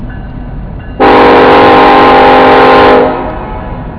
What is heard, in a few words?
train